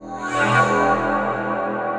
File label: synth horn; radio susan